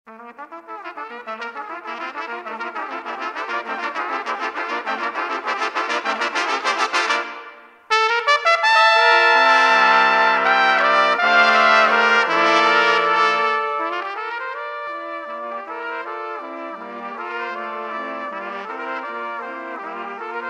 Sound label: Phrygien Phantasy